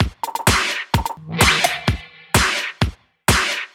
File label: Housebeats, 128BPM; Vengeance Samples; VEH2 Loops